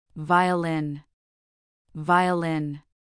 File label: violin